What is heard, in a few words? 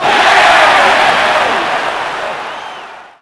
Large Cheer, Crowd; David Beckham Soccer; XBOX